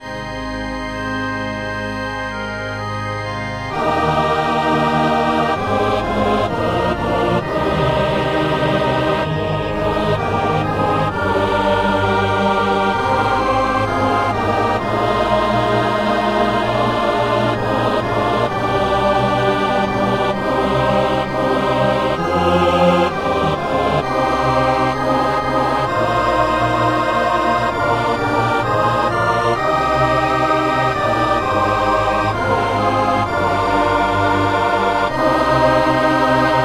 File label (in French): toutes voix; Messe du 17 janvier à Saint-Denis